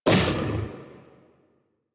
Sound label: Armored Vocal Attack Short; Enemies; Kubrow; Warframe